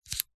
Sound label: household radio aerial telescopic push down or pull out; zapsplat